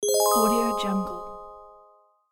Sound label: magical surprise